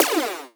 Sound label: Weapon Laser